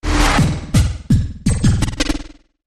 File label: Buzzy Bouncer